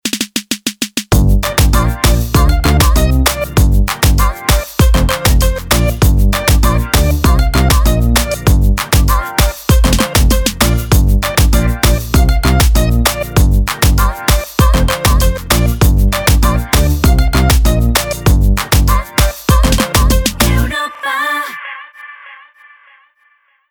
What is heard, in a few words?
JINGLE EDM; CREA